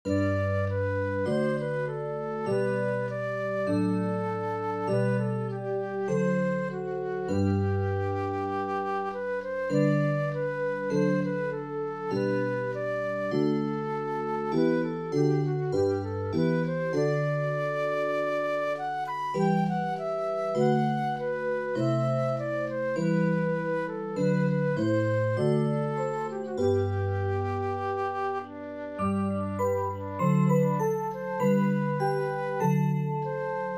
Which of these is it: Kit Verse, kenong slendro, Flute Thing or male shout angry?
Flute Thing